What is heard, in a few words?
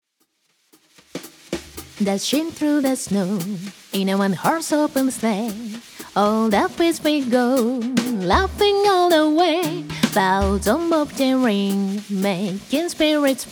jingle bells